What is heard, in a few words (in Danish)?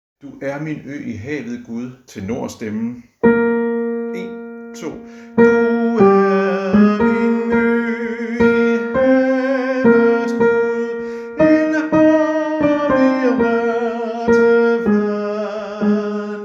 tenor; harlev koret